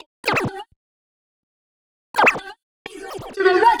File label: 126 G VOCAL LOOP, Funky Underground; Loopmasters; Funky Underground Vol 2